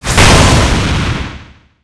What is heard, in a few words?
Freezing Distunce Target